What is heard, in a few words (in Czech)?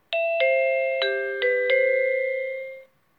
melodie